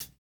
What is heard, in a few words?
hihat, house